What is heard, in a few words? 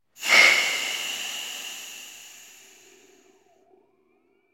Air Release Heavy